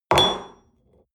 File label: espresso upset table hard, restaurant cafe